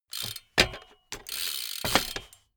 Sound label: bmx bike pedal grip freewheel